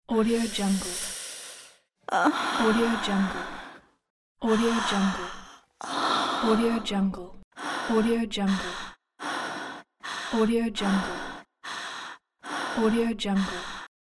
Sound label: breathing and moaning women